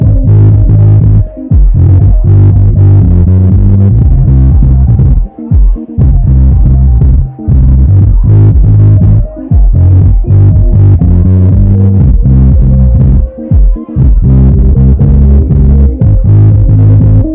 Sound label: Music Interior Party